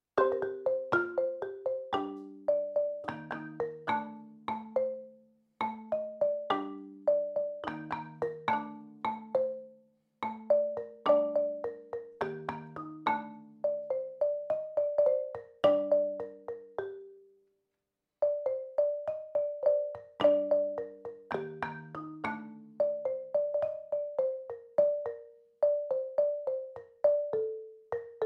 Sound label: Marimba